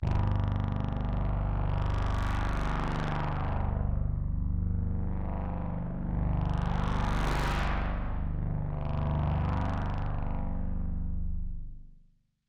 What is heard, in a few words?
Drone; Drones